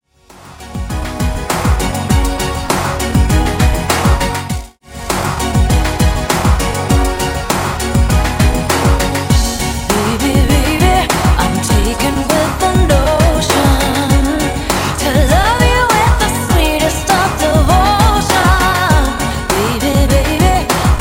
90s Re Drum 100 bpm

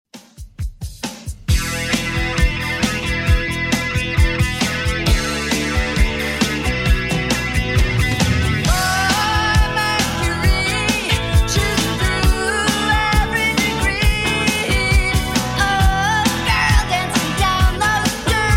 90s Redrum; 134pm

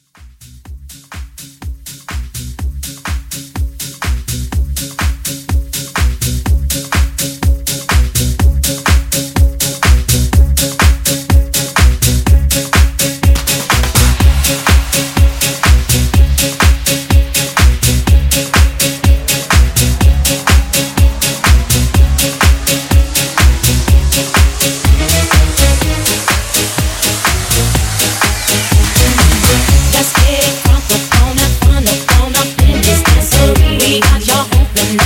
Transition 124 100

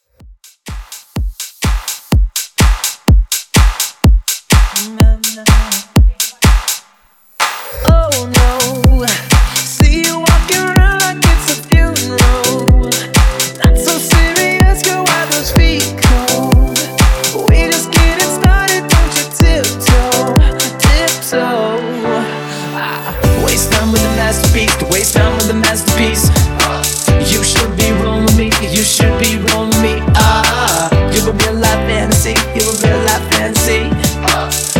Mashup Dance Electronic

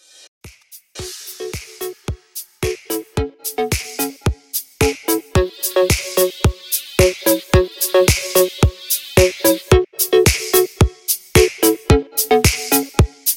R B Redrum 110 Bpm

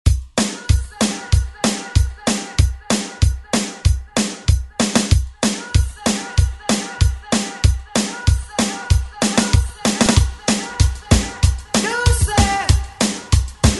80s ReDrum; DJ Mhark

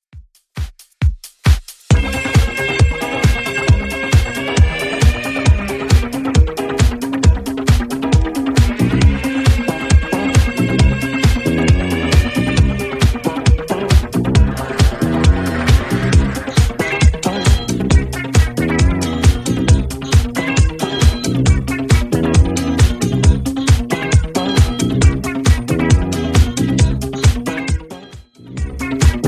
70s Redrum 135bpm

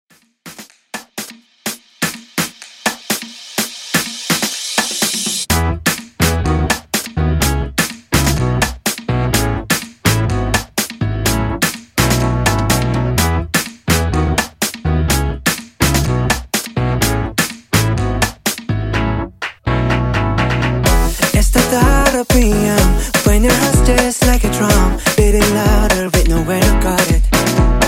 Pop ReDrum 125BPM

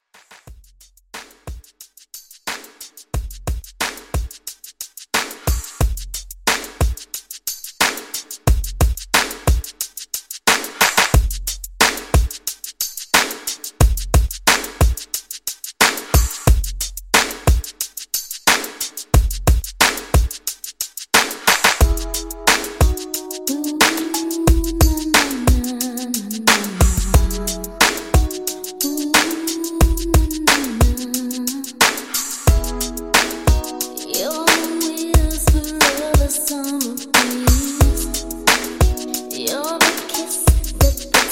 90s ReDrum 90Bpm

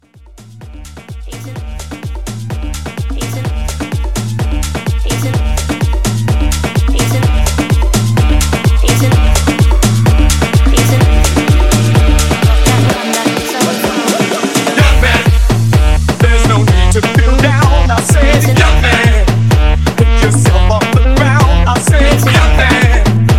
Tribal Mashup 127

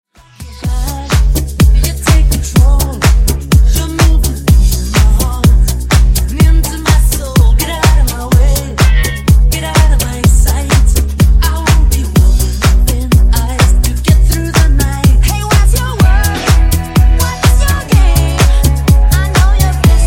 Funk Nu Disco 125Bpm

